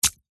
foley footstep single barefoot walking wet concrete swiming pool edge